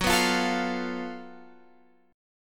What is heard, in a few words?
Gb Minor Major 7th Double Flat 5th, Guitar Open F; strummed; x,13,11,12,12,12